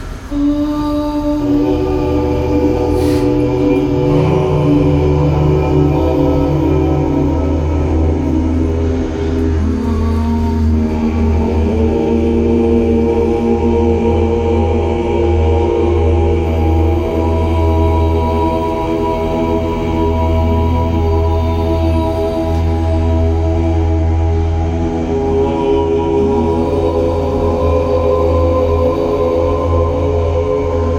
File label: ommmmmmmmmmmmmm, new age music; jumalautaparty26; jumalauta zen committee